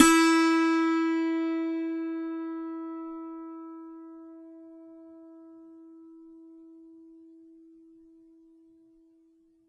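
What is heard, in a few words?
E4, dulcimer beat; SoundBytes; Early Patches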